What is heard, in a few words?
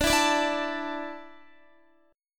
D Suspended 2nd D, down, Guitar; Dsus2; strummed